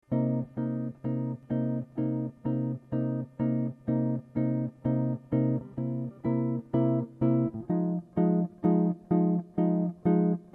blues

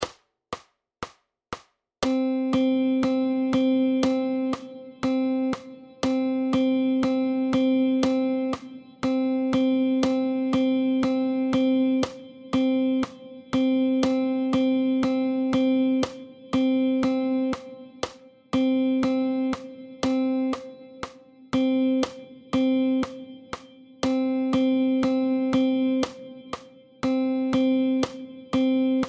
rhythm

